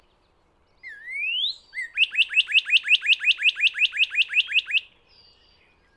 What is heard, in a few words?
wetlands noca